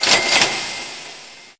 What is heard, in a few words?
register